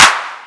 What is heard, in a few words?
Clap